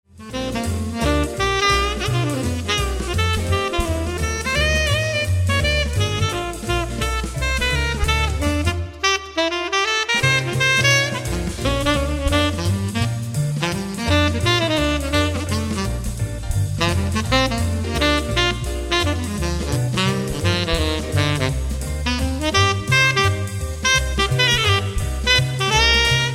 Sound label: tenor